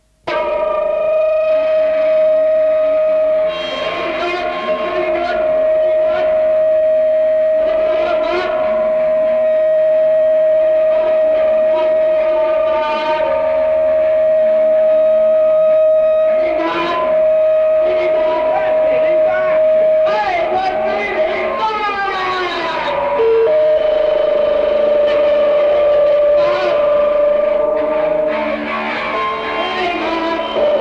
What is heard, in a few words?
Feedback